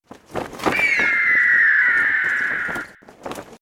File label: monster